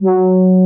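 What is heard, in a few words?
horn G3, synthesizer analog horn; AKAI S6000 CD-ROM - Volume 1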